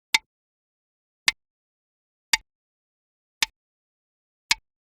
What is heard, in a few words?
floor goban